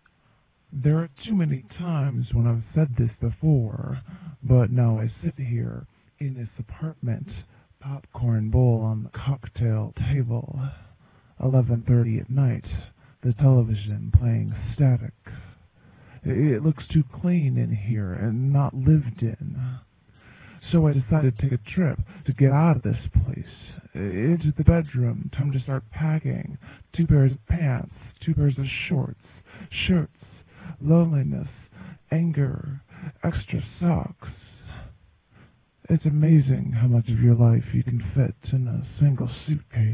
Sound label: vocals